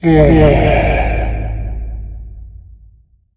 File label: Yawn; Smite; god Kumbhakarna